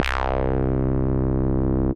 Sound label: Bass